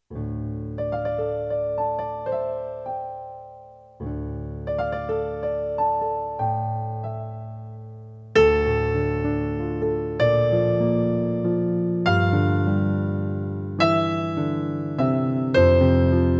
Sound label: Refrain Descant